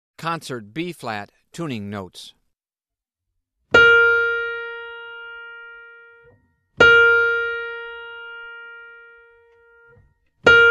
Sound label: Tuning Notes; Aebersold Play-Alongs